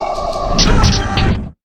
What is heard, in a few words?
Save Station Close